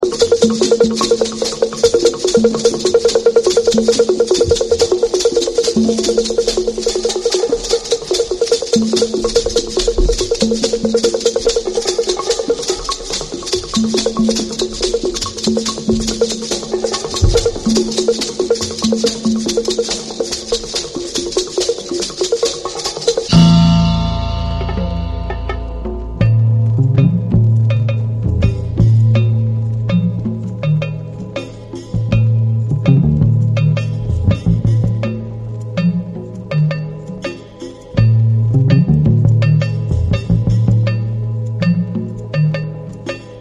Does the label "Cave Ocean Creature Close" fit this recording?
No